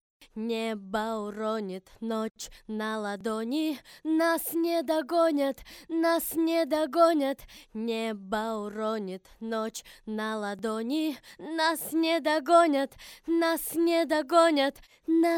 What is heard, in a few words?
Acapella